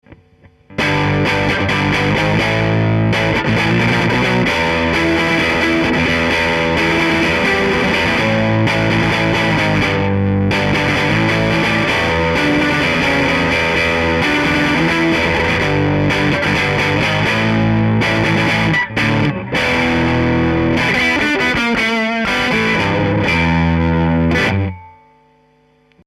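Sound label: avantone ck7 Guitar amp Dist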